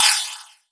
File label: Quesy Oneshot; Sonic Foundry; Esoterik Beatz